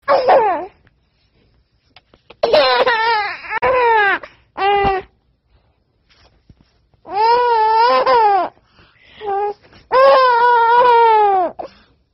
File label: Baby Laugh